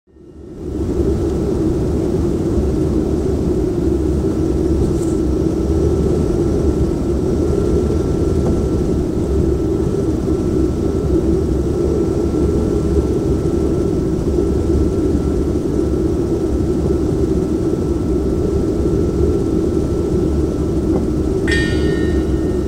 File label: Pier bell